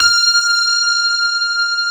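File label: grand piano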